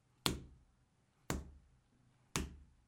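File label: fist sound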